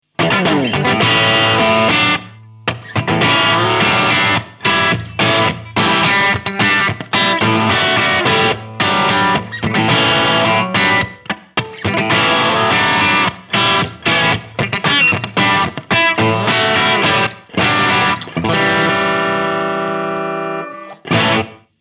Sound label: Marshall 1987X Crunch Single Coil